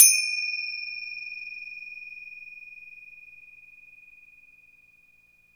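finger cymbal, Synclavier Percussion, World & Orchestral; ILIO; Synclavier Percussion 2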